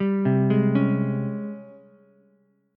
Ab Major 11th Ab Ukulele C6; AbM11 arpeggiated; JGuitar

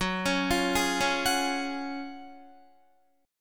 Gb Major 7th Suspended 2nd, Guitar

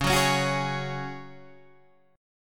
Db Suspended 4th, Guitar, Standard; 9,9,11,11,9,9; strummed

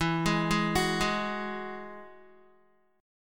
E Major Add 9th E, Guitar; arpeggiated; 4F,4A,5C,5G,5C,6E

